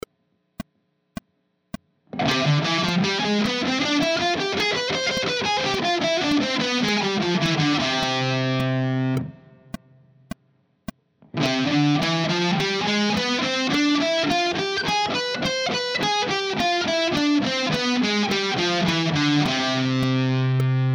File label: harmonic major